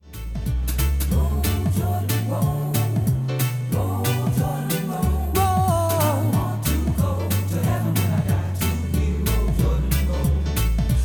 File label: gospel choir; roll jordan roll